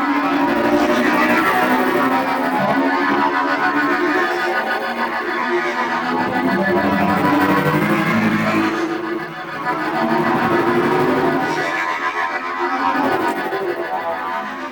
Hoverboard Engine Base Loop; Vehicles; Venus

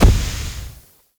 Smoke Explode; Survival Instinct; Warframe; Mods; Pet Mods

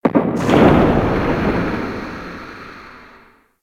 Galleon Eerie Amb; Ambience; Warframe; Grineer Galleon

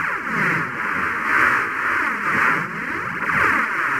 Wall Latch Loop; Warframe; Characters; Tenno

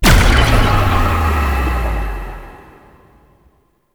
Apparition Spectral Impact; Warframe; Dagath

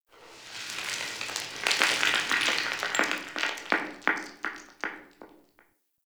Settlement Sand One Off; Warframe; Grineer Settlement

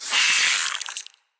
root spider vocal pain; Animals; Spiders; Vocals

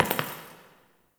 Idle Single Step; Tagfer; Warframe; Ambience; Entrati

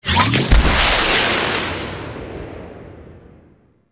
Archwing Blast Forward; Archwing Deploy; Warframe; Cinematics; Rail Jack

